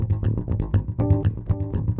Bb 120 Funk Rock

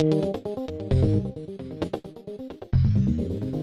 Funky 125-132 Fm7 9; Loops

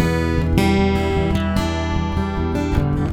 Picking G

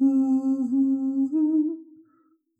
Soft Soulfull Solo

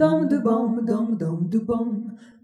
Jazzy Harmony

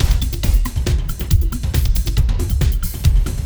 Clubbin' 138

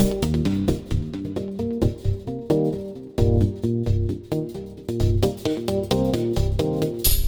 Funky 125-132 Cm7

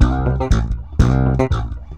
C 120 Steppin Funky

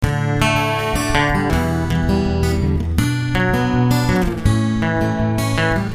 Picking D,G,Bm,A 83

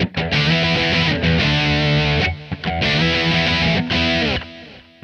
E4 95 chords

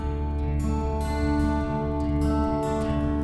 Picking G

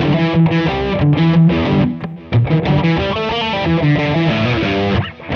90 Verse Rock E; Loops

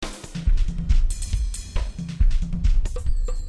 Jazzy 138

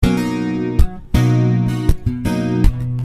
Punchy Bmaj7 80

